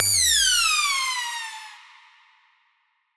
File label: Siren Horn down, Ramp Down, G; future rave